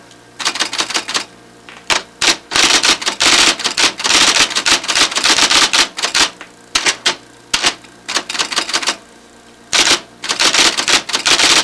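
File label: typewriter; HAUSHALT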